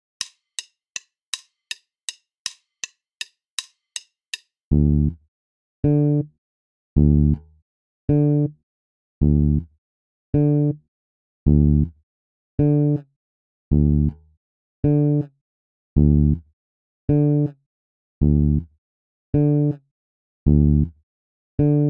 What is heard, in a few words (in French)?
decalage triolets exercice basse tempo 080